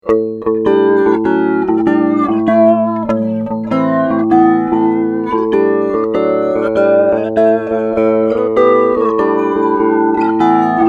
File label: flange